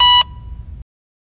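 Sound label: Heart Monitor One Beep, heartbeats & ekg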